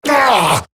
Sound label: Pain Eximus Grineer Male; Battle Barks